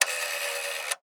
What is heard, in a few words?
rotary phone dial; prosoundfx